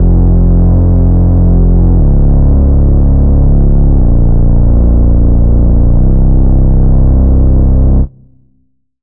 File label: Reese; Routed Sound; Serum 2 Expansion - Street Vanquisher Vol.1